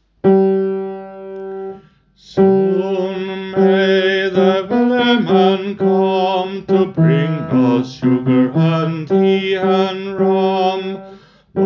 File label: wellerman tenor